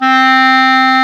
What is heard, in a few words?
CLAR, Clarinets, Orchestral Winds; Clar Cls; Roland LCDP04